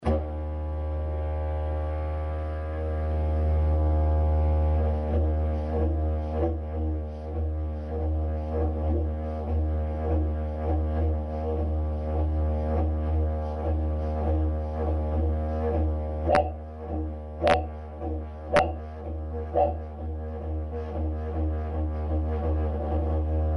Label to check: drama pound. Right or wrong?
Wrong